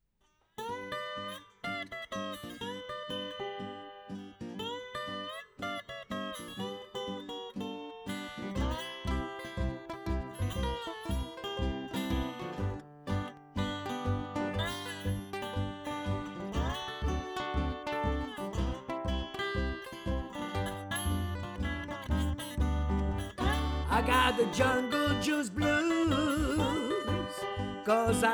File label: jungle juice blues